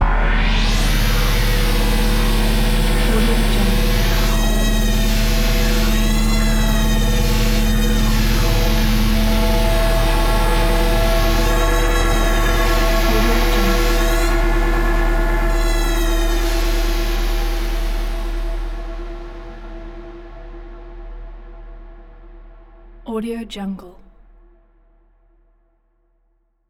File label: time drones